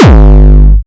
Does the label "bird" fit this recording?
No